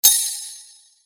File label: Sword Sound; Fighting Sounds; FXHome